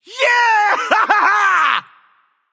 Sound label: yay; smite; voicepack; mercury